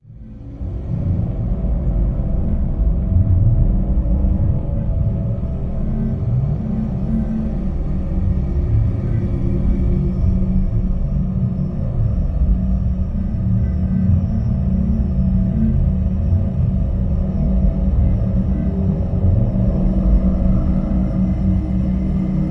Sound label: Alien Scan, Drone; Gothic Storm Music; Drone Worlds 3